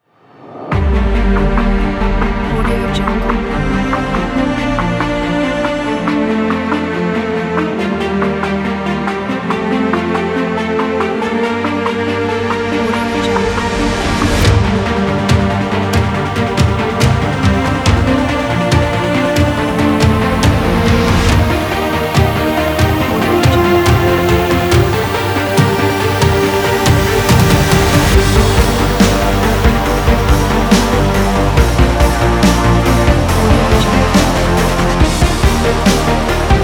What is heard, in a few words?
epic teaser